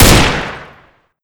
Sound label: carbine; garrysmod